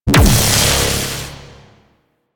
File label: Ground Laser Fire; Enemies; Abilities; Warframe; Teralyst; Bigalyst